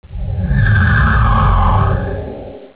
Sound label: cloak